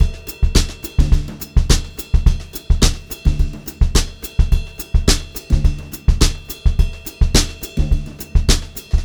HOTROD VARI, Brushed Drum Loops; USB Soundscan vol.39; AKAI

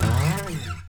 SLIDE, guitar; Spectrasonics; Hans Zimmer Guitars VOL-1; TAKA FX

